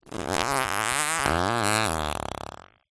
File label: cartoon fart